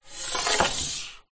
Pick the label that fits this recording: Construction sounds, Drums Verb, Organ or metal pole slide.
metal pole slide